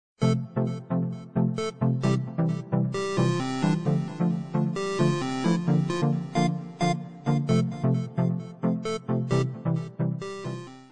samsung ring tone; samsung galaxy

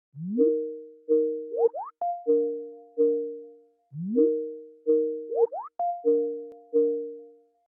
microsoft teams call ringtone

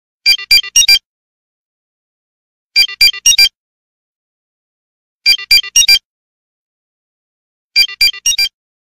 beep ringtone; android; kim possible

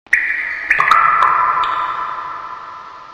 water drop sms ringtone

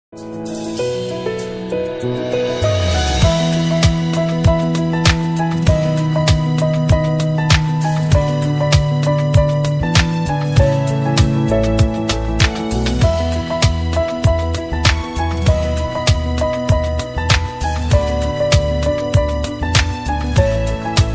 simple ringtone

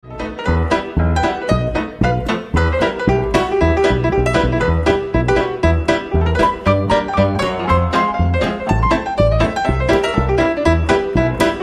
jazz ringtone; android; Xylophone

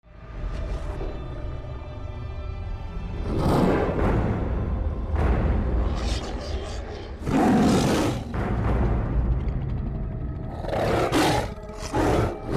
tiger roar ringtone

